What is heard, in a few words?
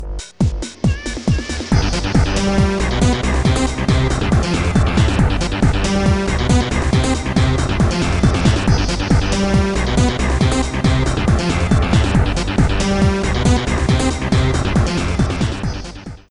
Victory; Iggy's Reckin' Balls; N64